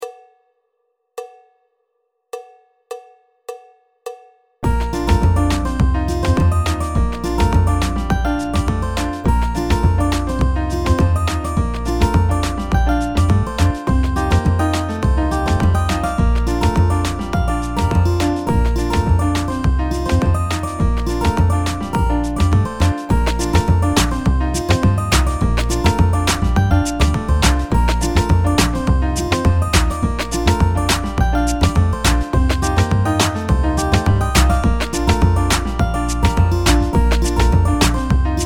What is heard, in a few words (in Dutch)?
Solo